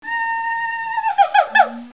jungle; Renaissance

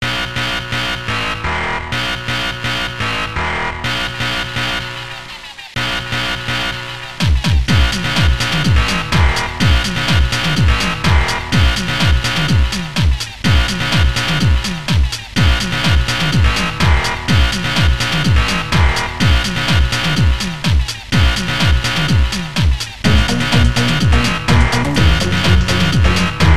Pink Noise; Protracker Module

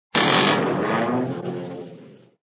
level Roll; skipandgouls

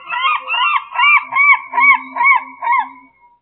Gull; SpecOps2 MPlayer Demo

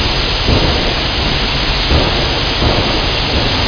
amb ind ust

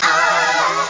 auauauauahhhhh

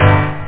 RIDE; Instruments

